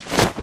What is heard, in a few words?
shorter BACKPACK cloth; aronwk collection; ndaudio